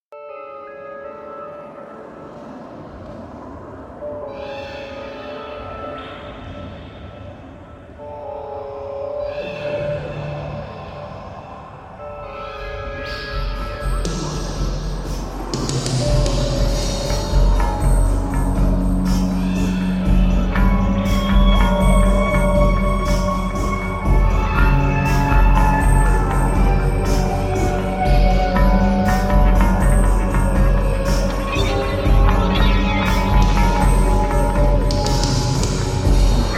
Ambient Spaces

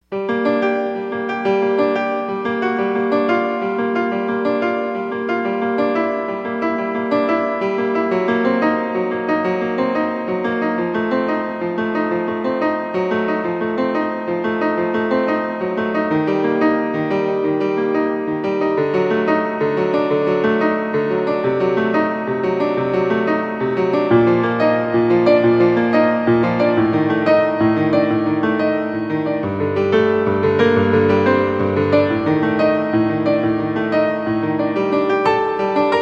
Prelude in G Minor, Solo Piano

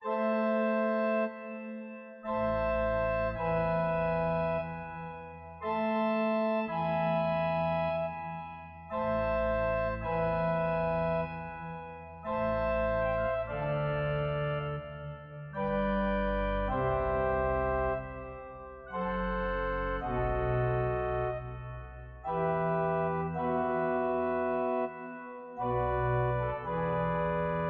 Largo Concerto in D Major, Horn and Organ; Francesco Manfredini

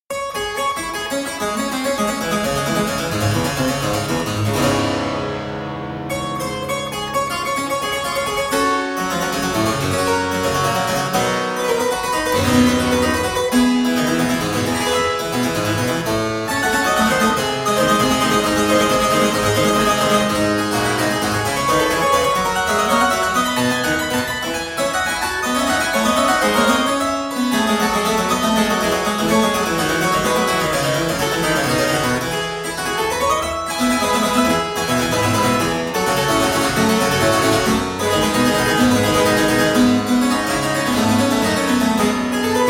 Suite no 1 in D Minor - Prelude, spoken; J Mattheson; Johann Mattheson - CD1 - The Twelve Suites of 1714

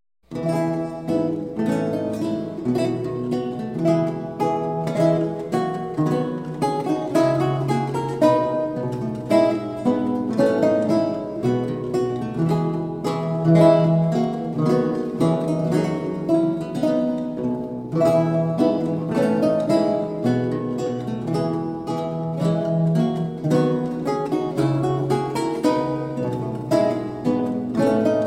Duets For 2 Lutes In A Minor And F Major Gavotte; Jan Antonin Losy - Anton Logy

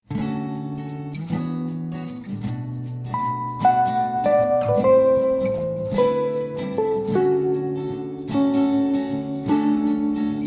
Rainy Days instrumental

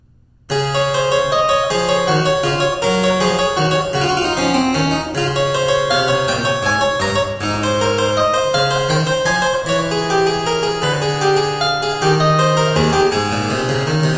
Suite Menuet, Harpsichord; Kreysing the Yonger; Der Getreue Music Meister

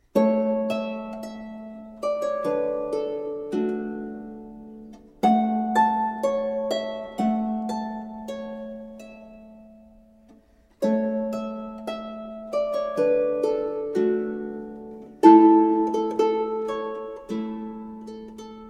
A Scot's Tune, Lute; The Jane Pickering Lute Book

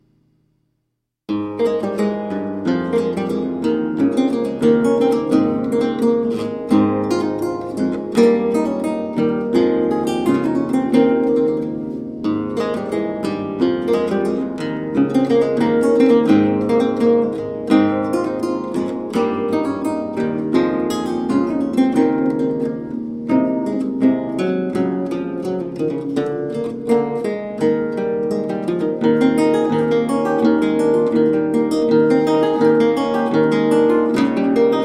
Sonata Per Il Liutho A-Dur Bouree, Lute; Johann Friedrich Daube

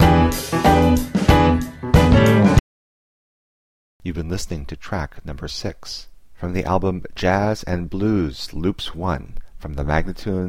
E, spoken, Jazz and Blues Loops; Loops For Licensing; MagnaLoops

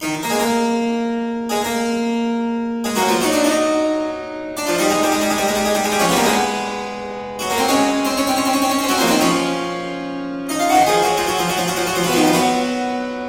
Fughetta for organ; Wir glauben all an einen Gott; BWV 681; Johann Sebastian Bach; ClavierUbung III

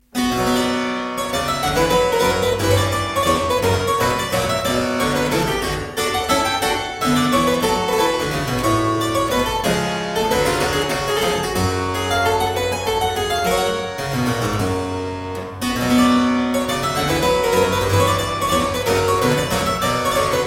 Suite no 5 in C Minor - Courante, spoken; J Mattheson; Johann Mattheson - CD1 - The Twelve Suites of 1714

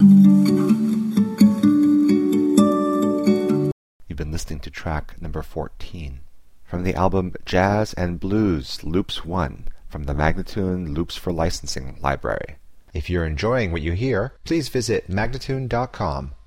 D, spoken, Jazz and Blues Loops; Loops For Licensing; MagnaLoops

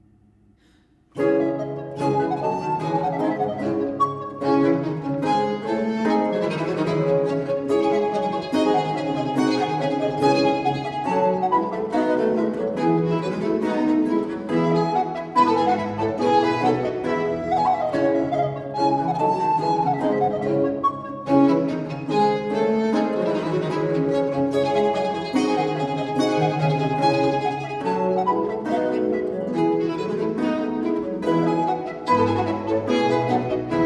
Trio Sonata in D minor Presto; Georg Philip Telemann; Bach and Telemann - Sonatas, Preludes and Fantasias

